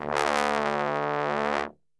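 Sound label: D bass trombone, Brass Super Section; Best Service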